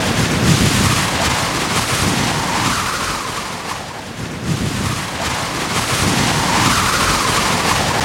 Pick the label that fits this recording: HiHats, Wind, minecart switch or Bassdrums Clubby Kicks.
Wind